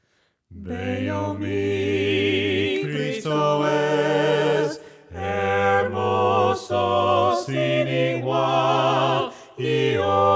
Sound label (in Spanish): VOCES, himnos a capella; cantos del camino